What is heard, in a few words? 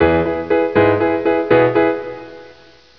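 PIANO ARPEGGIO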